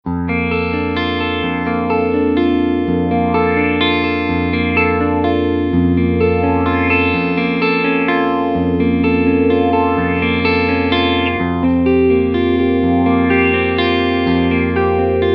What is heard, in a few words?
filter; Mobius